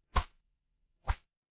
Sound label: paper fabric cloth whip